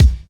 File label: natural steel kick drum sample c sharp key